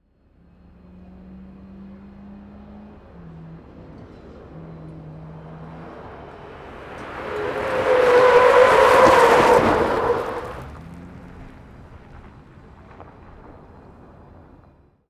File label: Drifting Around A Corner Pass